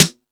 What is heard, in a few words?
Beat Box; E-MU Pro-Cussion